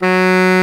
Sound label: TENOR SAX F#3; Greytsounds VOL-1